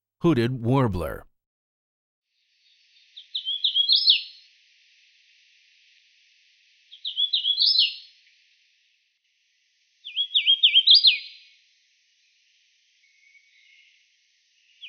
Hooded Warbler

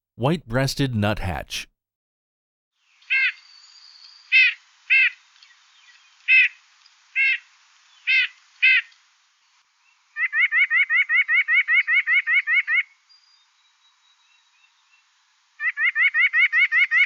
White-Breasted Nuthatch; Non-Game Birds

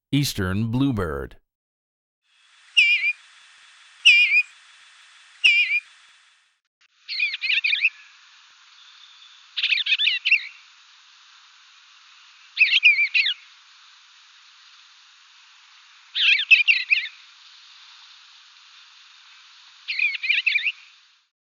Eastern Bluebird

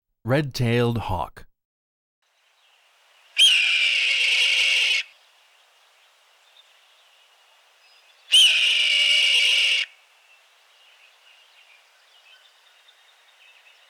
Red-Tailed Hawk; Non-Game Birds

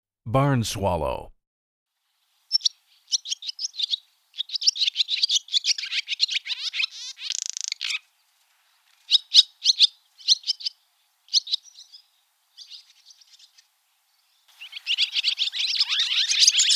Barn Swallow, bird calls; Non-Game Birds

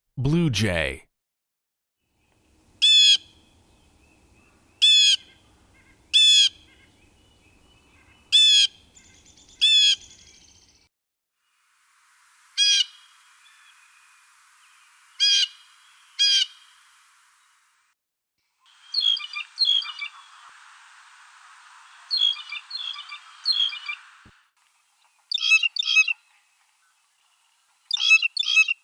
Blue Jay, bird calls; Non-Game Birds

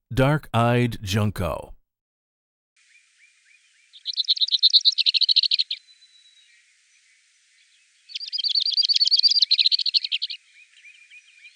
Dark Eyed Junco, bird calls; Non-Game Birds

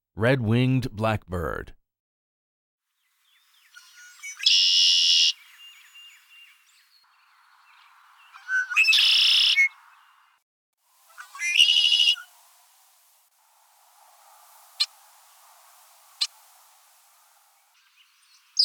Red-Winged Blackbird; Non-Game Birds

